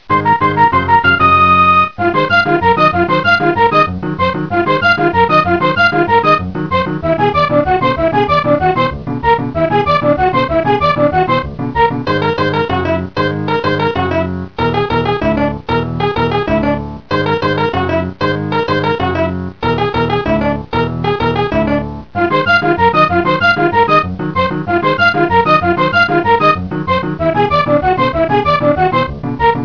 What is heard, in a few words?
shop menu; zeldamod